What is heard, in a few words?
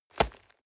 nylon leather hit